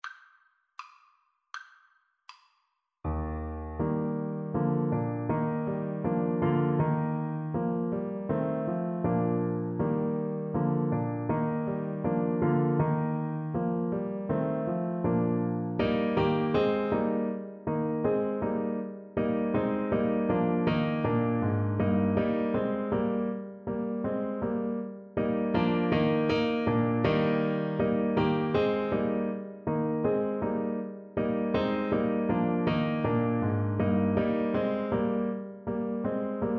harmonius blacksmith, clarinet; handel

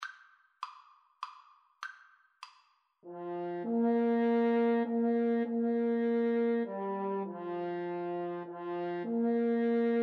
Clarinet French Horn Duet

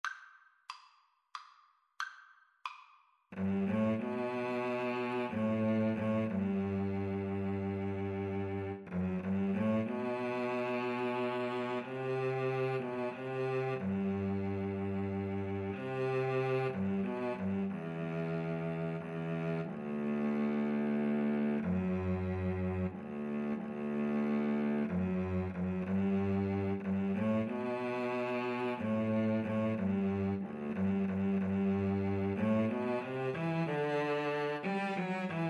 shenandoah, Cello Duet

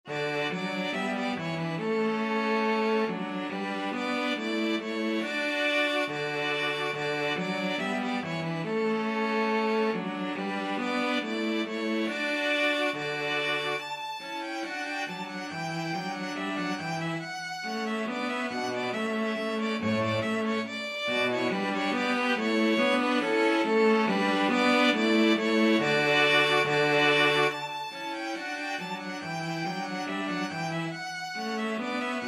string trio

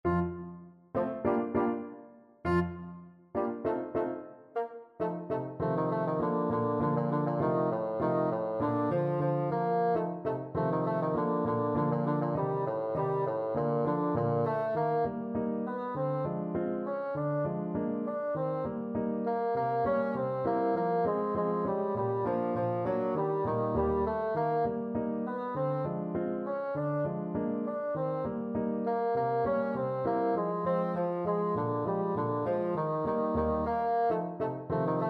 bassoon

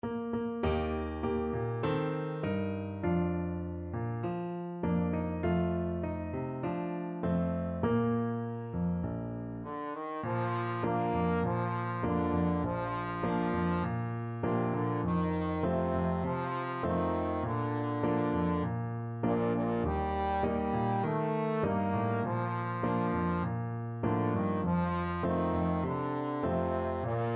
balonku ada lima, trombone

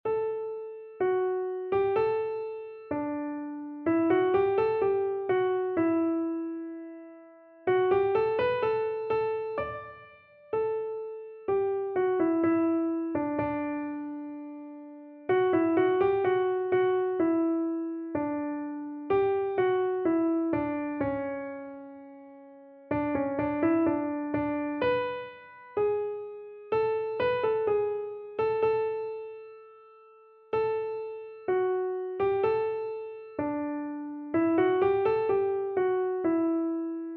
thine be, keyboard

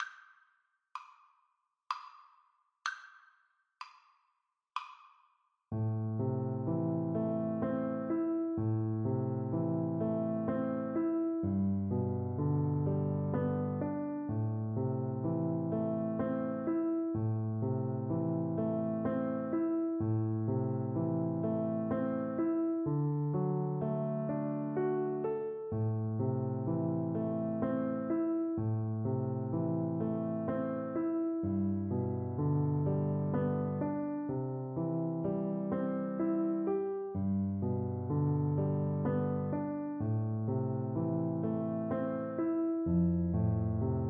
scarborough fair, oboe

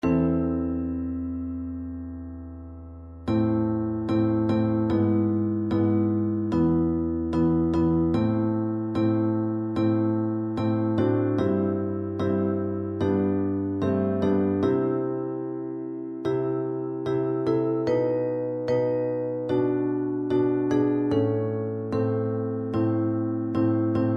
percussion

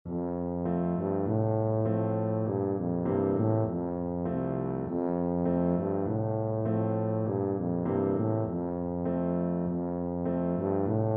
alouette, tuba

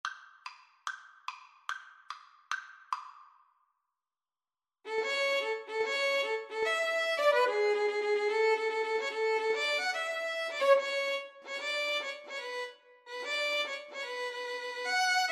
VLN duet, Violin Duet

